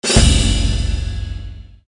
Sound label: quest accepted